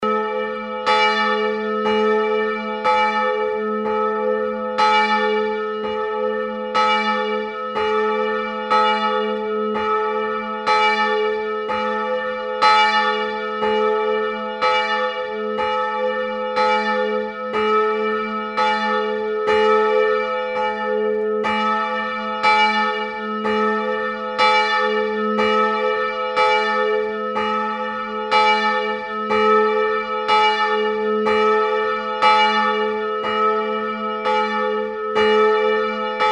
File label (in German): Glocke